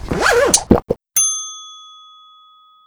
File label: Health Pick Up; Angry Goy II; SoundEffects